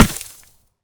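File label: Bullet hit ground; Hovl Studio; SFX Battle sounds; WhiteMan Unity2D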